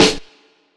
big snare single hit a key, Royality free snare sound tuned to the A note